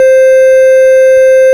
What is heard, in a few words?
ORG, Farfisa Clarinet